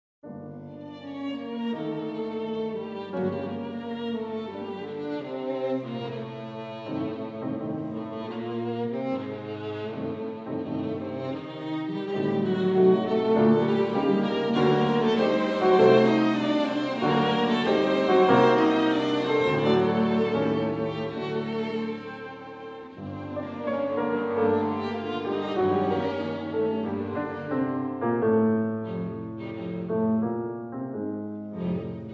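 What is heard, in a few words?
piano trio; novak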